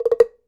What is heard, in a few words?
Temple Block Trip Low; Sonic Foundry (Sony Creative Software); Joe Vitale Latin Percussion